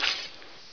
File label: sickle slice; dusk